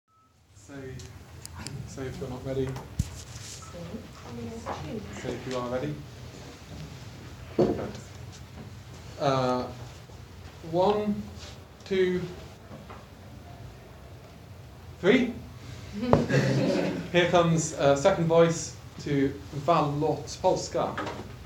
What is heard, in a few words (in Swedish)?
Vallåtspolska från Orsa 2nd voice; efter Björn Ståbi